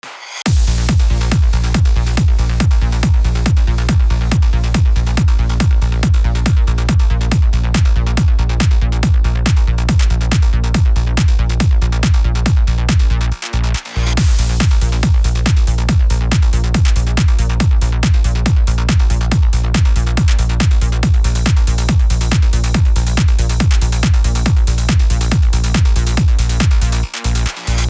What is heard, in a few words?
trance kick bass acid; FL Studio template; audio stems; walkthrough video